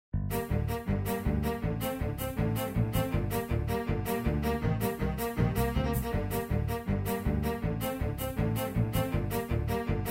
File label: Violoncello